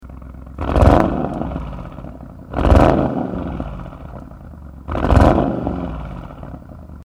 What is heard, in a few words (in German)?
Standgas Klappen offen, Audi RS6 C8 Abgasanlage; Stand Klappen geöffnet; stoll sport